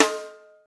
trebly steel snare drum a key, Royality free snare drum sound tuned to the A not; snare-drum-samples